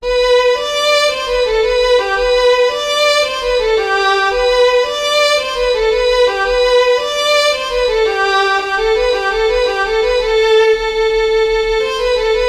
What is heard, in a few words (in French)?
polka piquee du magnoac